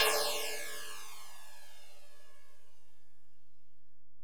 Ride, Alesis DM-PRO, Drum Machines

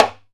LOTMB MTD, Timbale; Timbale Battery

